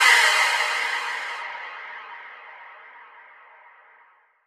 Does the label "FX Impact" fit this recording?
Yes